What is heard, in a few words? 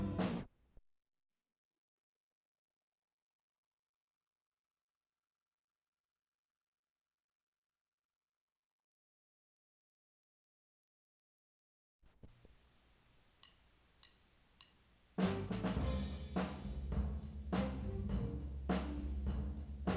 floor